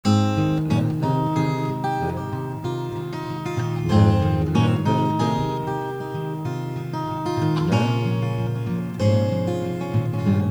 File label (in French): guitare seulement